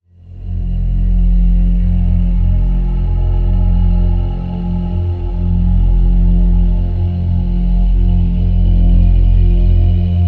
Drn Crevice F

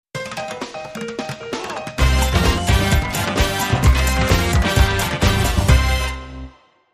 Latino Beat

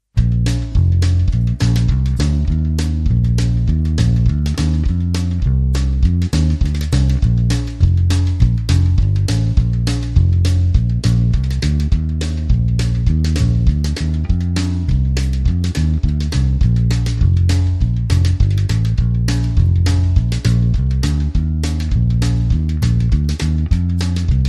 Down Home Bass, Electric

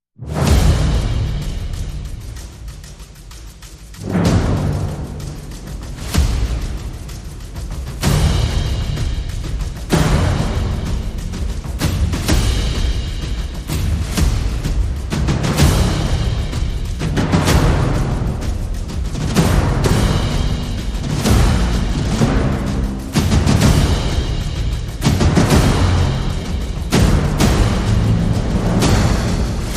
Perc and FX

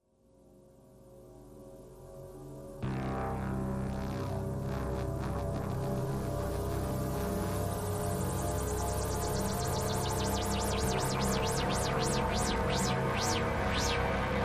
Drones

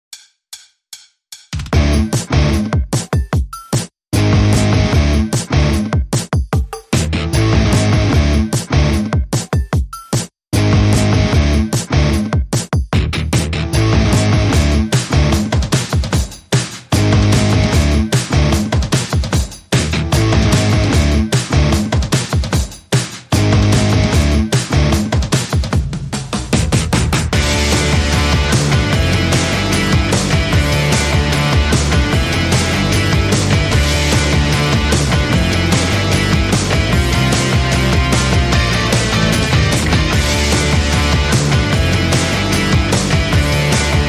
Less Guitar Parts

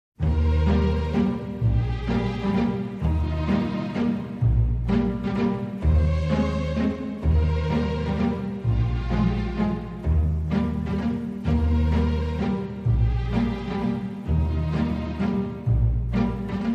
Strings Only

